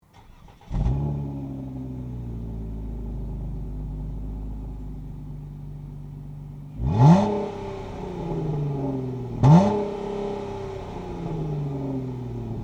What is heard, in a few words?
Dodge Avenger Heat